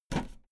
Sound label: video tape recorder flap hit